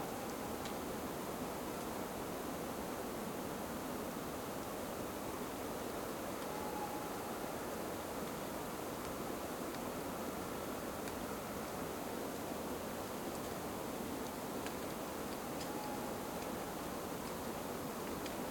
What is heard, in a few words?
Forest Amb Loop